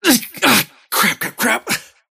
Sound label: attack pain; dota; kez